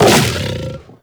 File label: Kubrow Armored Vocal Attack Short; Warframe